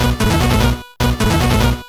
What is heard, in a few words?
beatbox; BLAIZER